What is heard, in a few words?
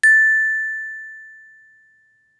Small Glock